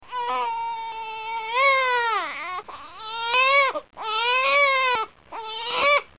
Crying Baby

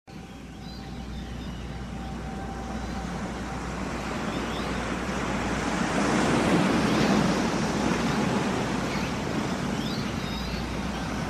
Ocean Life

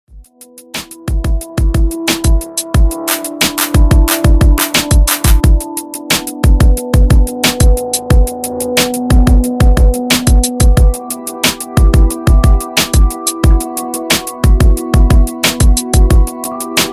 Hip Hop Beats

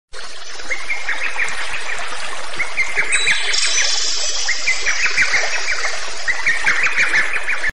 Bird The Forest

